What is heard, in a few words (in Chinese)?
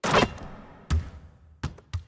灌篮; nba; 战中